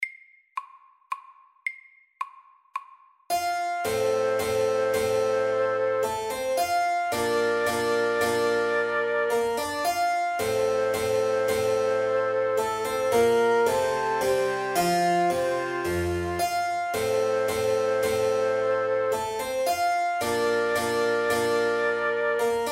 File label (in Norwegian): Menuett MIDDELS